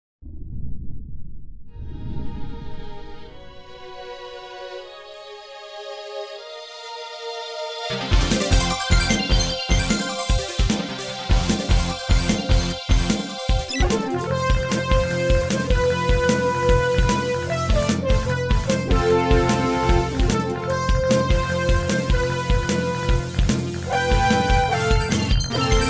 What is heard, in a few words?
synthrck